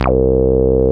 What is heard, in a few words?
BAS, Eurotech; Sounds Good